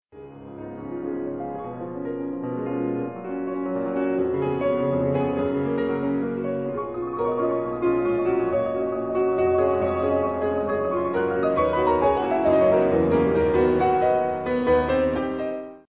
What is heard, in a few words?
piano, 2 pianos